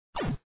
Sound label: shot; InfernalGames; tankz